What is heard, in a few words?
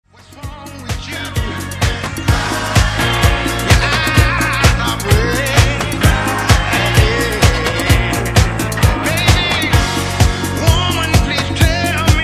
Funk